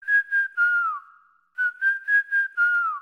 cute whistle sms

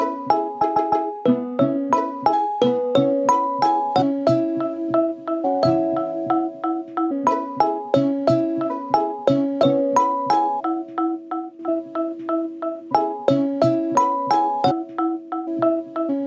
Samsung A91, Klingelton

